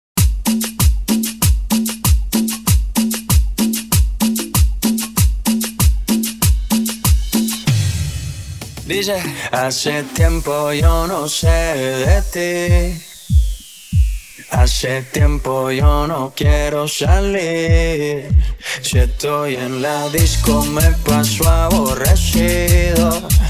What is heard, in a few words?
Intro Outro 96 BPM